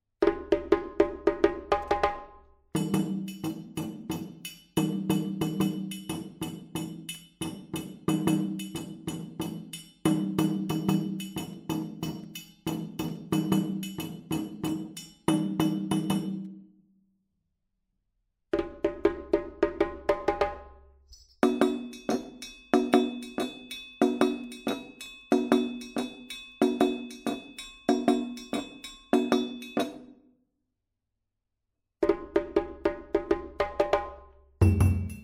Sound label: Toro Dununs, djembe